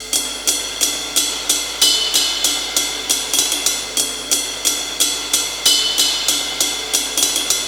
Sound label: 125 Classic Breaks, 125